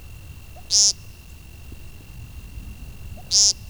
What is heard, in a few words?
Peeent; wildlife